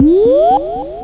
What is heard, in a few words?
ZOOP; LiveWire